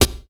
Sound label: Clear Snare Single Hit D Key